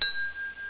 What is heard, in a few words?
CRYSTAL; 铃声素材